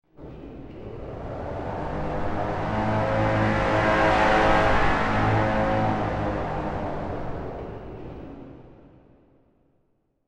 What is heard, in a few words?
Whoosh Transition Sci Fi Materialize Tonal Slow, Whoosh Transition Materialize Tonal Slow Build Reverb; Ascend Descend; Whooshes (Sound Effects:Sound Design)